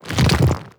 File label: step movement, zombie blind, foley; creatures